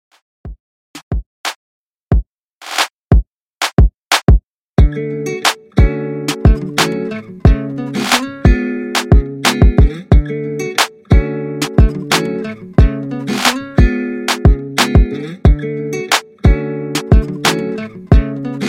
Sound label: 90 Bpm Clean; Redrum